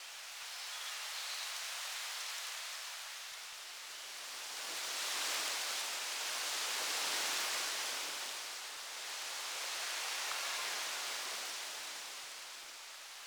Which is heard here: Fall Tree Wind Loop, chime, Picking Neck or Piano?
Fall Tree Wind Loop